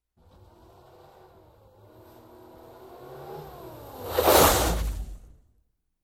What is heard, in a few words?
Car Nearing Suddenly Brakes